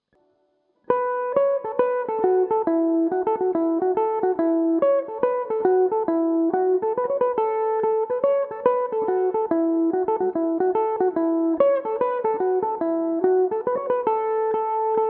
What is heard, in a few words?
katie scollards polka amaj played as a slide, mandolin; Belmuse